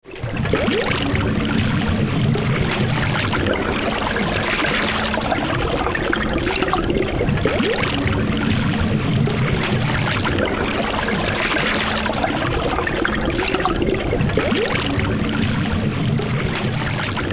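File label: ambience hitchhike; Wario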